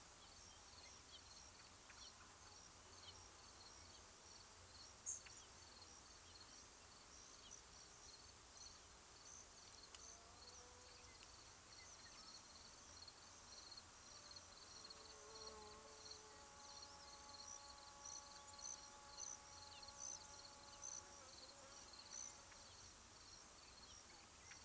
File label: Sfx Environment Hub Ambience; Akxolotl